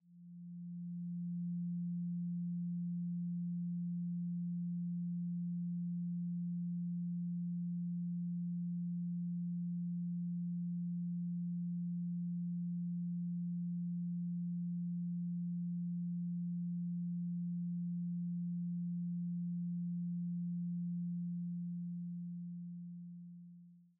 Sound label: tone